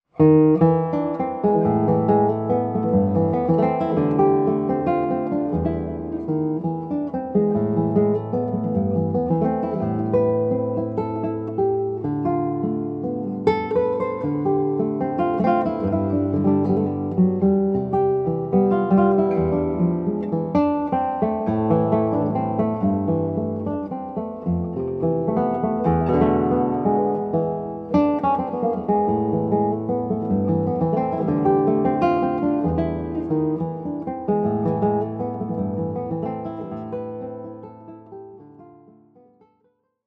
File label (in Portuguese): valsa